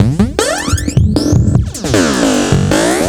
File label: Bouncing Break, Drum Loops